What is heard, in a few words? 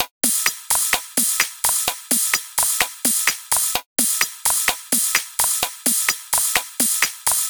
House Hihat Loop - 128 BPM; Drums; Loops; Top